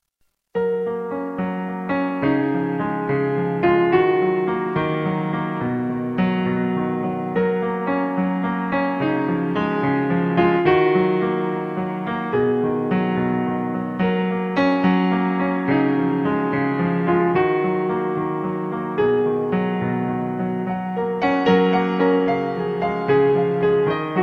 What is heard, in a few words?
piano song